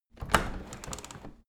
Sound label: window handle open tilt; objekte; fenster